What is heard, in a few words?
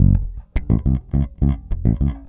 Pop, Bass